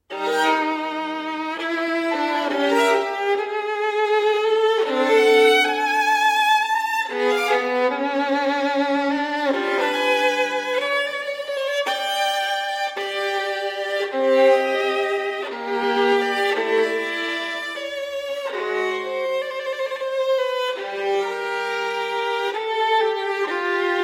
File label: Sonatas For Violin Solo, Sonata No. 1 in G Minor - I. Grave; Ysaye; Op. 27